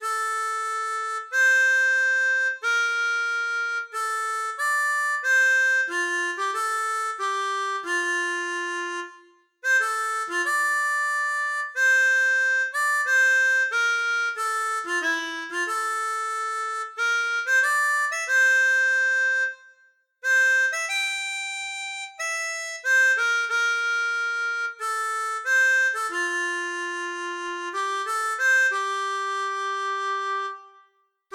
Hymne portugais, harmonica

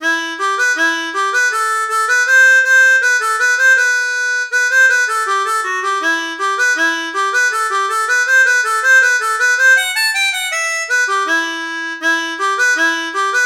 Scottish finlandaise, harmonica; Folkloriques

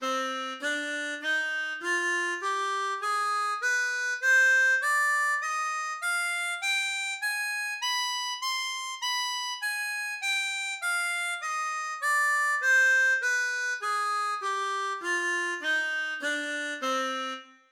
Do Mineur, Gammes, harmonica

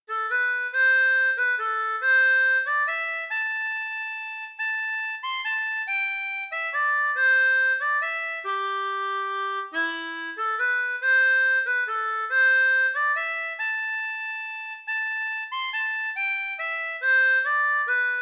Valse Ecossaise, harmonica; Folkloriques

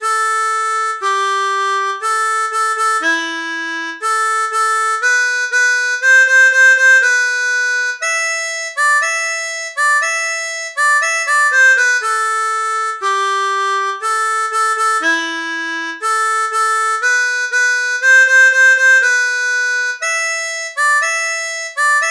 Vent frais, harmonica; Comptines

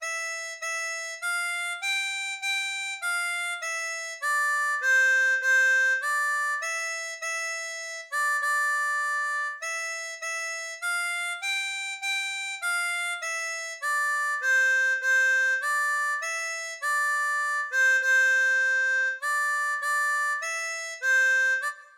Hymne Europeen, harmonica

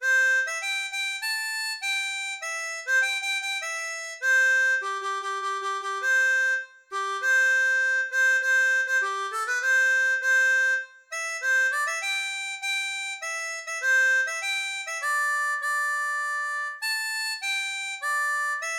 Hymne Chinois, harmonica

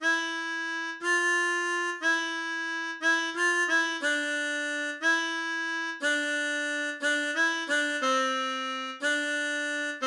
Valse Triste, harmonica

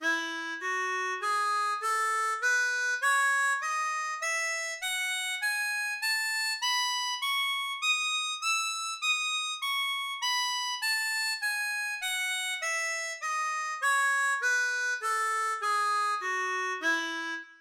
Mi Majeur, Gammes, harmonica

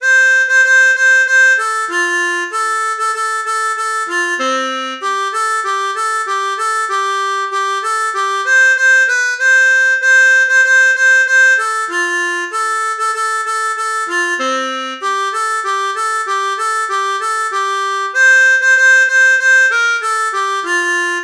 Bonjour, ma cousine, harmonica; Comptines

